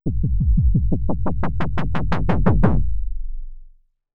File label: Kick Drop; AMG - Prototype Drum & Bass